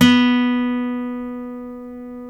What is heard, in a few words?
STEEL B, FOLK STEEL Guitars; Spectrasonics; Hans Zimmer Guitars VOL-1